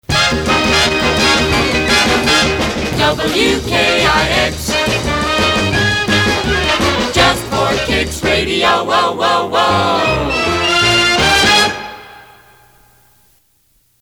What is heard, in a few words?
Half and Half Vocal; WKIX; PAMS; (Vocal first & last halves of logo)